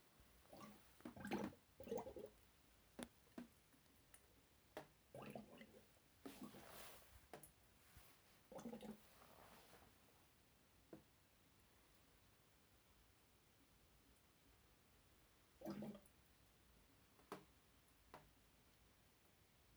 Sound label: bubbling